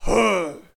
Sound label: Ogre Hit; The Battle for Wesnoth